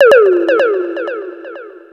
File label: DRUMLOOPS, Disco; Best Service ProSamples vol.48 - Disco Fever; AKAI